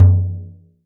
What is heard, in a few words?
tar; 96khz 24b mono; instrument de musique; Le Tar est un tambour sur cadre, percussion d'origine arabo-andalouse; Tar – fabrication Norb